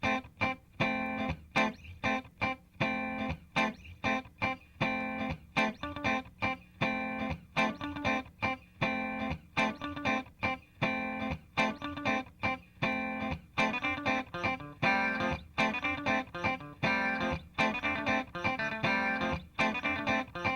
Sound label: Max Feedback Sound on Sound, digital delay; electro-harmonix 1-echo